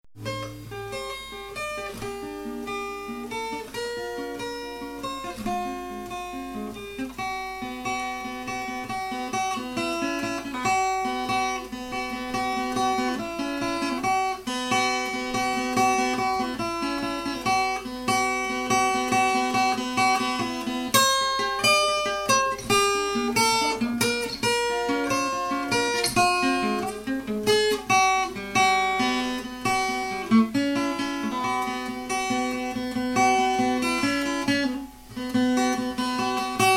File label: Acoustic Guitar Ramble